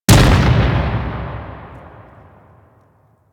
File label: Impact Ground Slam, Weapons; Warframe; Furax